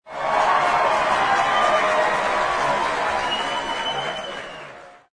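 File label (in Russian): большая толпа аплодисменты свист; Bolshaya tolpa aplodismenty svist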